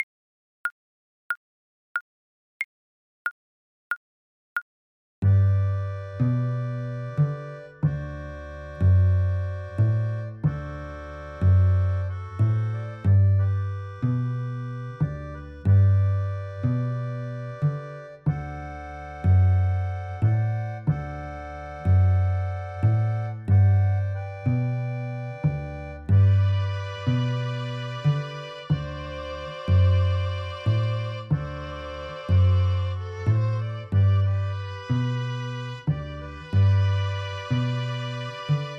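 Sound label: VLC karaoke, Cello; Hush Little Baby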